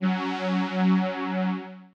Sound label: string